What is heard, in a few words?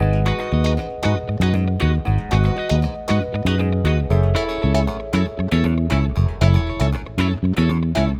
Backing, Nu Disco, Cm 117bpm; Zero-G; Construction Kits; At The Mansion